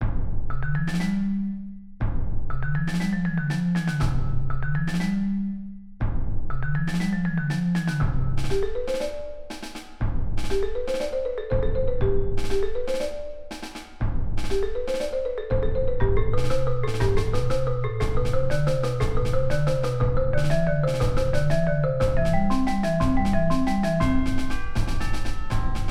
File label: Rudimental, percussion; Rudimental Riot 1